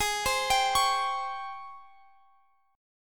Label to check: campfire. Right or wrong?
Wrong